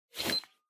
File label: Stopwatch Digital Split Reset Arm Swish